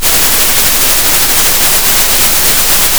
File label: pop, Sound of a thunderstorm